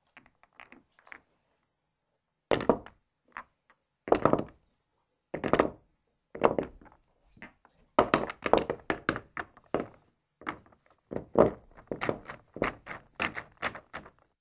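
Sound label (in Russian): закладывают в пирамиду и приминают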